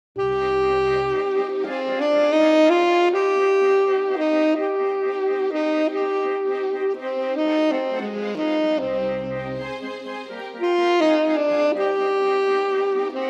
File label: Tenor Sax; Play Easy Classics for Tenor Sax Book 2